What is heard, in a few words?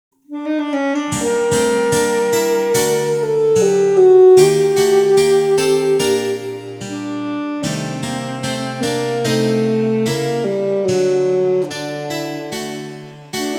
Sonata Largo; Eccles